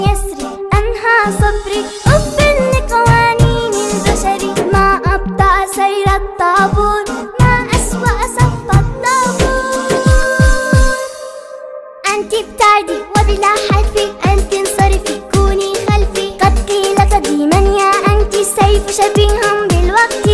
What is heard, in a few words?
Arab kids ringtone